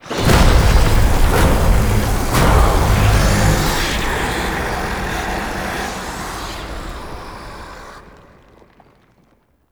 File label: Alarm Stinger; gameplay; Warframe; Entrati Labs